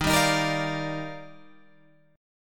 D sharp Major 9th, Guitar Open G

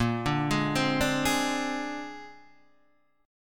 Bb 9th Bb down Guitar DADGAD

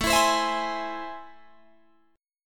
A Minor Major 7th, down, Guitar, Open G

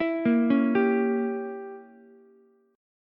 G Diminished 7th, Ukulele; arpeggiated; 9,10,9,10; JGuitar

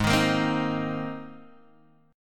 G sharp Major Add 9th, Guitar Open G

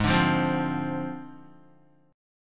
Ab 6th Flat 5th down Guitar; strummed; JGuitar

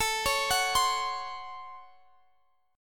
Db Major 7th Suspended 4th Sharp 5th A

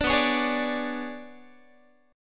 B-Minor 6th Add 9th B down Guitar; strummed; 4A,4E,5A,5D,5G#,6C#; 17,19,16,18,18,x

